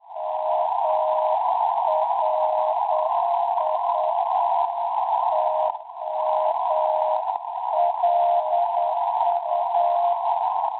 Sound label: NDB, 378 kHz; LU; Le Luc; Francia; Schwarzsee